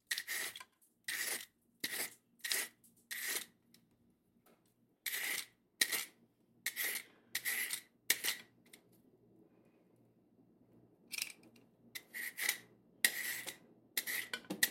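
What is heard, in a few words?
peeling carrots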